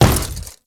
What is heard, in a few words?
Step Default, Footsteps; Warframe; Grineer